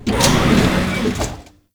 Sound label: Elevator Start; Venus; Warframe; Veilbreaker; Kahl